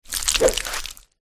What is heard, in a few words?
crab attack voice up